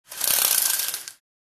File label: Cordless blind raise up